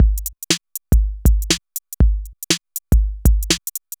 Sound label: Synth Loop, BPM120